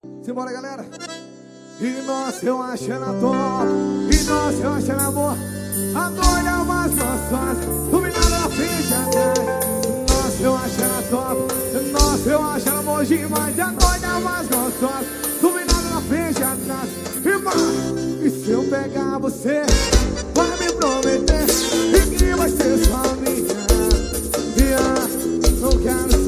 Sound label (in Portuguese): intro forro